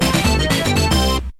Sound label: Happy Jingle; Riverboat Gambler; Williams; pinball